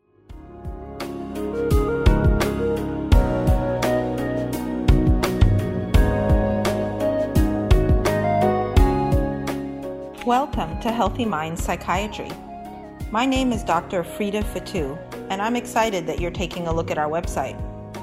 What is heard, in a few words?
music; Healthy Minds